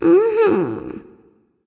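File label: nonverbal agree; smite; ratatoskr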